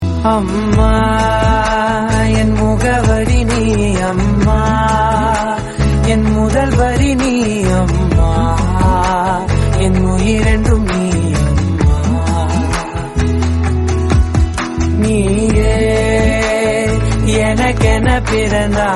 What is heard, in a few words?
Tamil